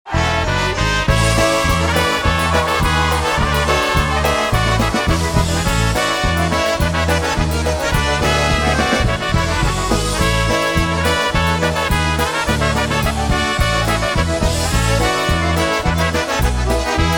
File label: Polka Joy Polka Live Jam